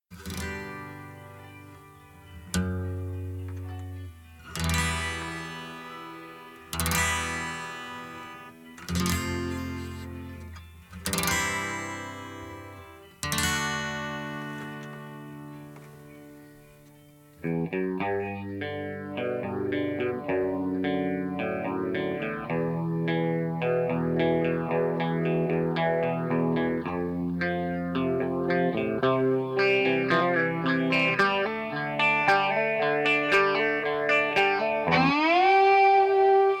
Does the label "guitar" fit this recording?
Yes